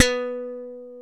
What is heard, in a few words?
B 2 slap, bass